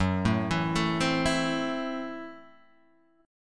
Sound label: Gb Minor 7th Flat 5th, chord; arpeggiated; JGuitar